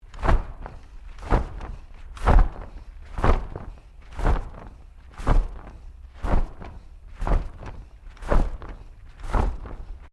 Wings Giant Flapping Loop, Created, Creature, Dinosaur, Monster; Sci Fi, Fantasy, wings flapping for a giant creature